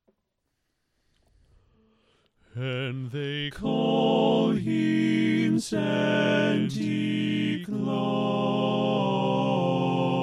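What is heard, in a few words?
Baritone, Barbershop Tags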